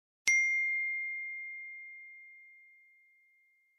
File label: Whatsapp Notification Tone; iPhone 12